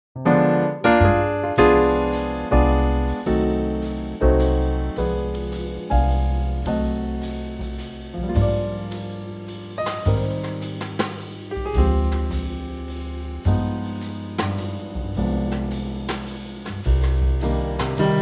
Trio Cm; key - Cm - vocal range - C to C

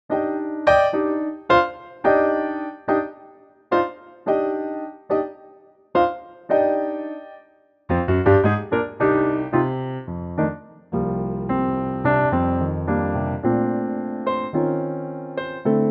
Bb piano latin; key - Bb - vocal range - F to Ab; latin Pianotrak; Stays in tempo all the way.

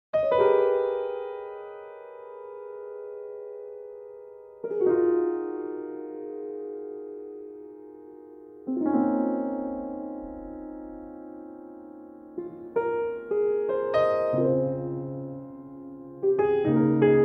Ab piano

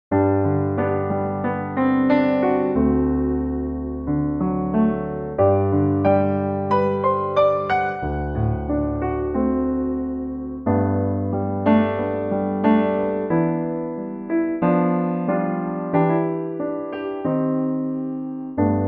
piano G

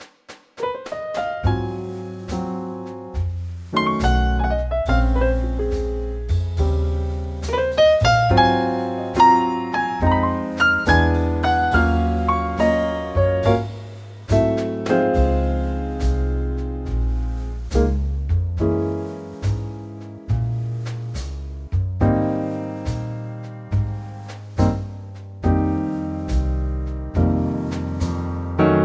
Ab Trio; key - Ab; vocal range - B to Db; Wonderful Trio ballad arrangement of this classic standard

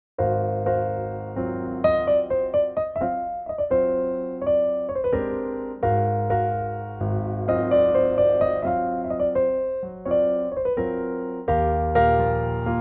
piano trak Eb F G; key - Eb to F to G - vocal range - Bb to E (optional G top note)

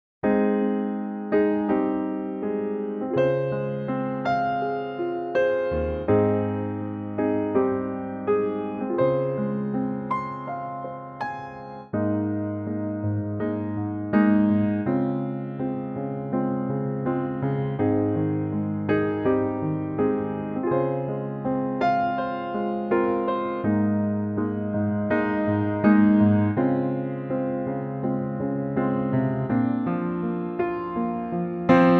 Ab Bb piano

